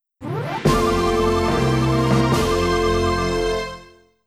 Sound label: Congratulatory Message; Wii Room (Shopping Update) Soundtrack